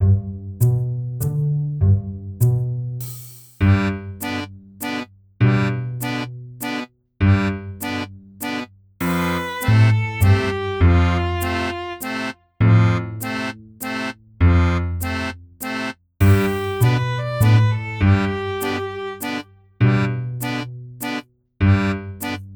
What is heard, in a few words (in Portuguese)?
apenas acompanhamento